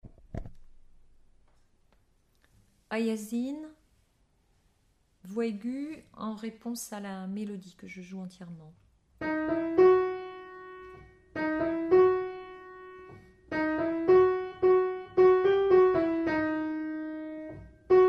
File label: Ah ya zayn Voix